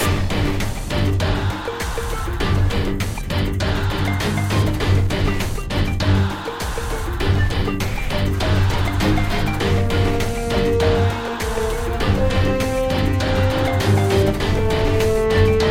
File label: bat qiecheng loop